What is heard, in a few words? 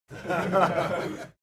laugh male